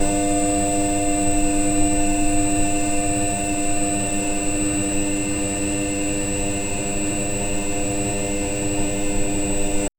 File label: flickering lamp bulb